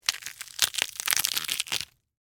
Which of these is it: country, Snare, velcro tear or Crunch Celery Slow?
Crunch Celery Slow